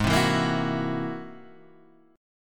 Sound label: G sharp Augmented 9th G sharp; G#+9 Chord {4 3 2 3 5 2} strummed